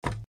footstep wood

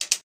door

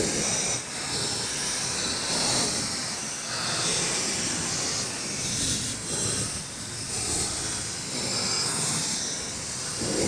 loop ambient

